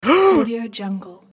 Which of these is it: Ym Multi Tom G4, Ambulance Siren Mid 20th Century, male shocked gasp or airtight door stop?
male shocked gasp